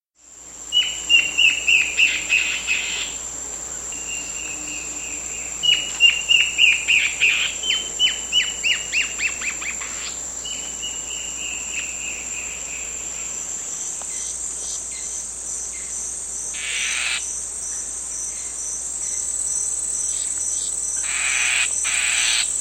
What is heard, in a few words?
Warbling Antbird; S. America